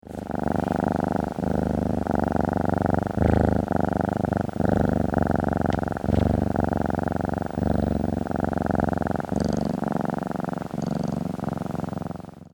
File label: Cat Purring